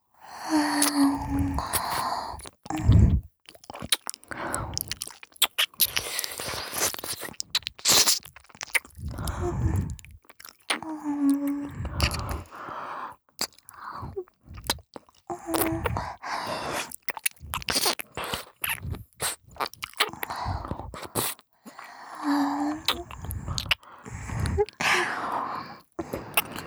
耳舐めで表情とろけさせない練習用エンドレス耳舐め

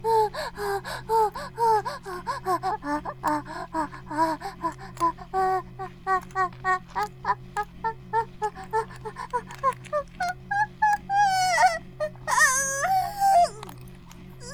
バイブ放置, ASMR

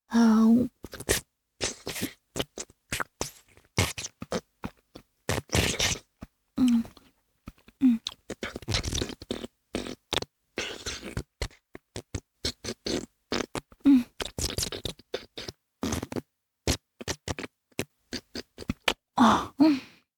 フェラチオ(弱):尿道に舌先を入れて優しく舐める, チュパ音; チュパ音抜粋集

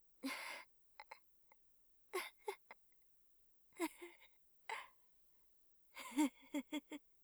空笑; 効果音なし

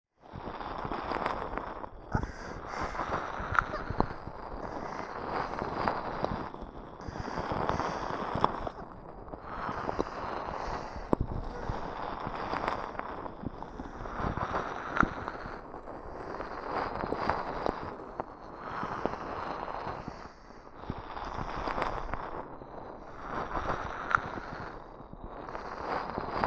お耳のオイルマッサージ, 強め; 癒しの音 詰め合わせ♪

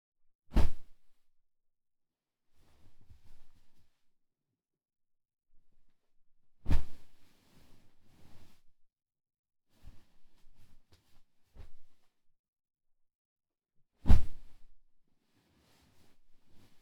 布団 に ダイブ する; KU100; 布団の音

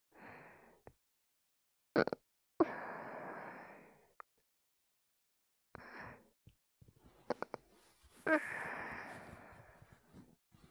寝息、好きだよね?

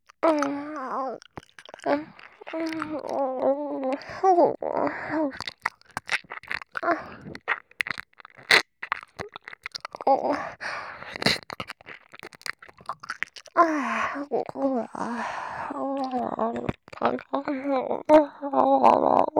超耳舐め左; 巨乳であまえんぼうなデレデレ系のオレの嫁!; ダミーヘッドバイノーラル; リアルSE

